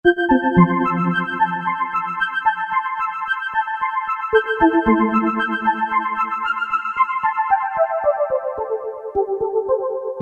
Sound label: High Glissz, Alesis QS8; Factory Preset examples